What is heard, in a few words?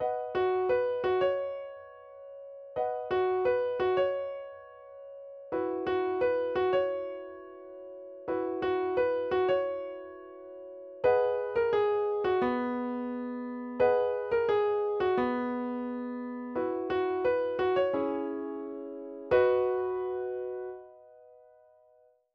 instrumental